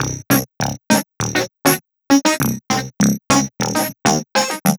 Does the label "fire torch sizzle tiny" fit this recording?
No